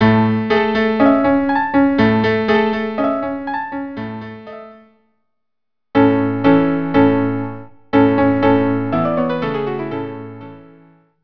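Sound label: cello piano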